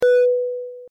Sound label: navi prompt; AMapNavi; AMapNaviKit